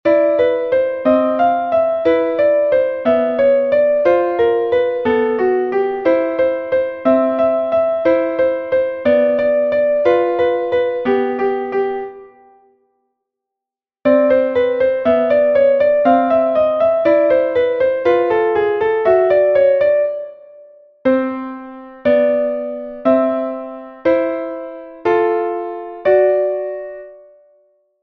wechselnote; italienisch: Note cambiata, französisch: Notes de passage; dommer 1865

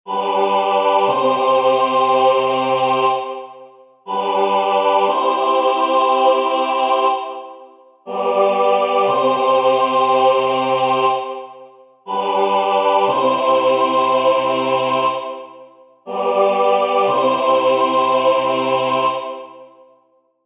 tonschluss; dommer 1865

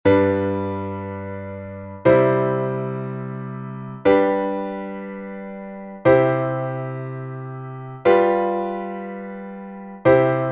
ganzschluss; Harmonielehre; dommer 1865

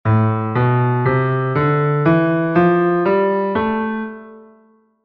Hypodorisch

